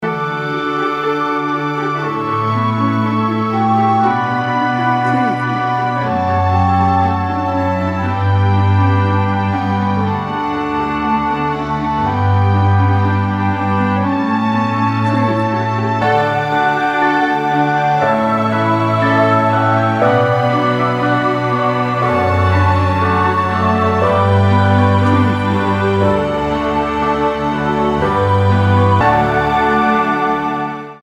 pachelbel canon in d

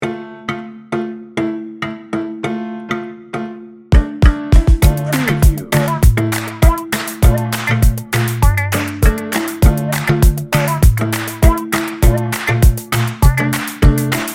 friendly vibes 60 second

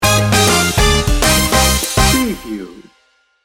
salsa sting bumper

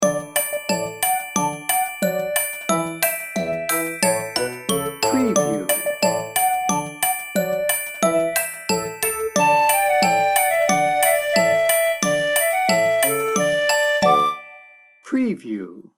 wheels on the bus

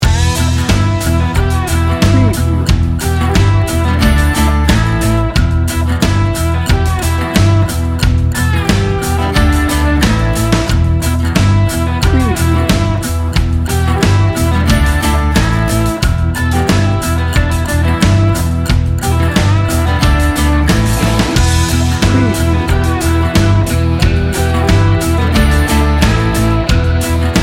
southern blues rock

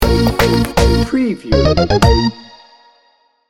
urban electro sting bumper

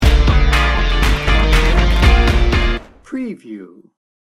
funk junkie sting bumper

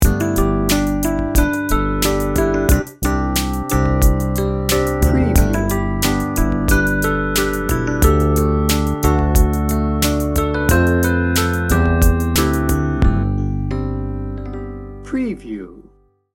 mellow reflections

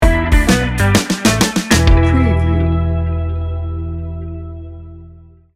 day trippin sting bumper

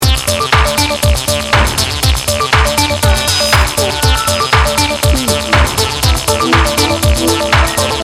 upbeat innovation loop

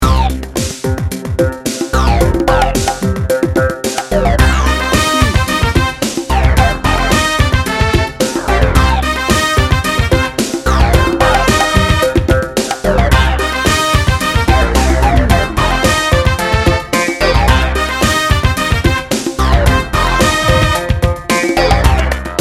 funk feel good

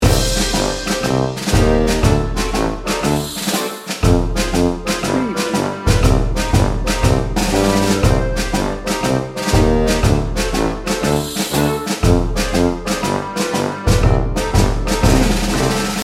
tipsy in tuscany loop

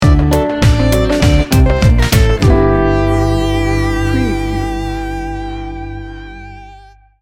folk slide sting bumper

